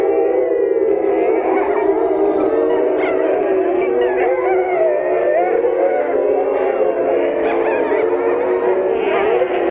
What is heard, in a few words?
howl